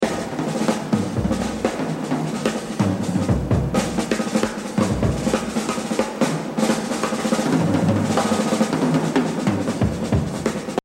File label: Exercise, jazz drumming; Exercise 26 Buddy Rich - 100; The Evolution of Jazz Drumming (Gottlieb)